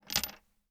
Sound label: Metal Bolts; 99Sounds; The Warehouse